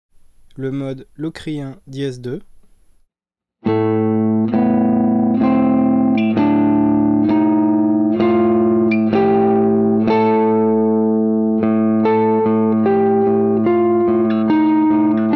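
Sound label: single String locrian; locrien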